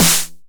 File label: 909 SD Tape Sat